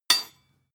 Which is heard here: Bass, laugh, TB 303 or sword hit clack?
sword hit clack